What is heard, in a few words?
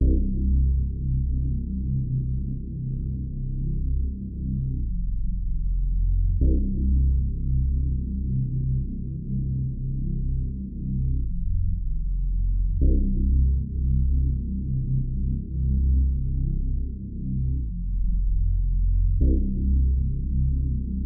150 BPM C Min Bass; Trap; Melody Loops; Loop Stems; Cymatics; Nightmares; Abyss